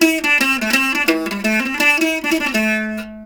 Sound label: JAKAY; Spectrasonics; Heart of Asia